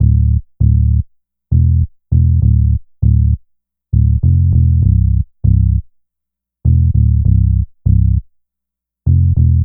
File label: Synth Bass; Bass X